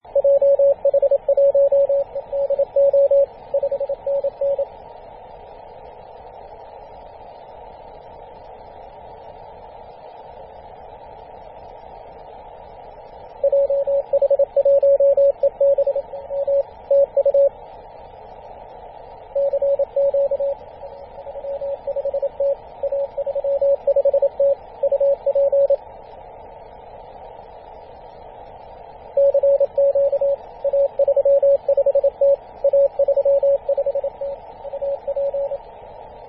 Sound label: 24.898MHz CW